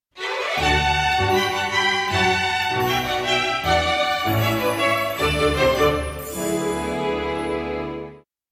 Tema de victoria; Yoshi; SSBB

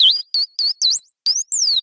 Grito de Shaymin

